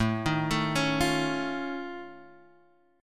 F Augmented 7th A, chord

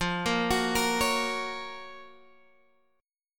Gb Major F; Listen to Gb/F arpeggiated

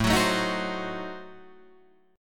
A Diminished 7th A down Guitar Drop D

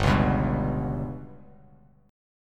G Major Flat 5th Bass Standard, chord; GMb5 strummed; JGuitar

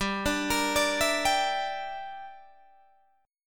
G Minor 6th, Guitar Open D

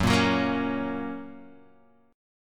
F sharp Major, Guitar, Half Step Down

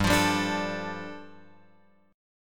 G 7th Suspended 2nd G, Guitar Open F; strummed; x,2,5,2,5,4

